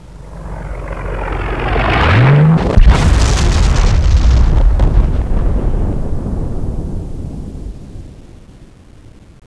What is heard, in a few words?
teleport forth